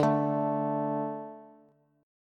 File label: D 5th D, down, Bass; D5 strummed; JGuitar